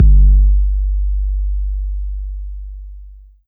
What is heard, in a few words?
Kick 808 Huge